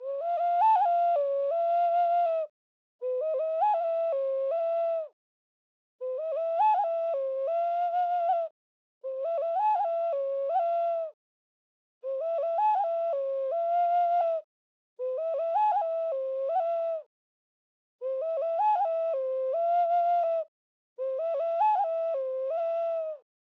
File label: Hip Hop Ocarina No particular scale Flute 80 BPM